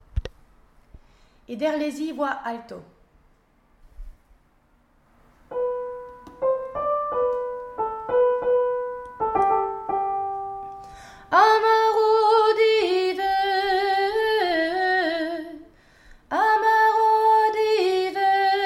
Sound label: Ederlezi Voix Alto